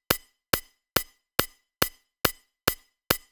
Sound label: Hi Hat Loop, Psytrance